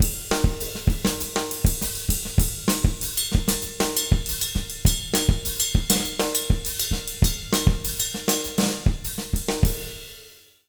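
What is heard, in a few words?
100 Mozambique, jazz latin drumloops; USB Soundscan vol.08; AKAI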